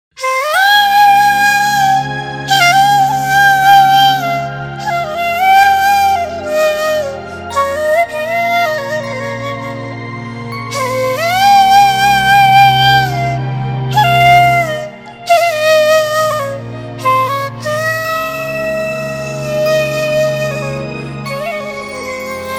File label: ringtone